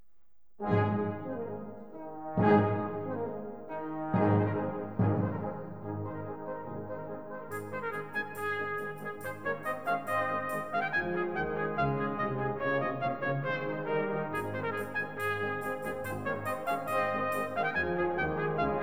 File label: Tambourin